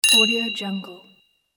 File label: clink